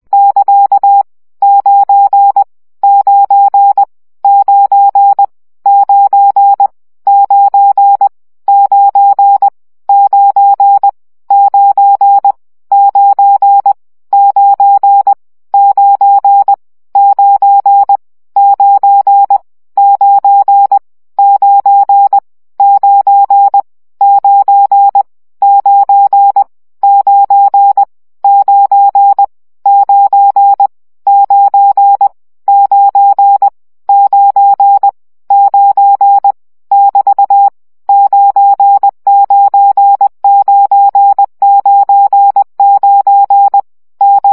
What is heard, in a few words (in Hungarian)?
CW gyakorlas 1 17 baud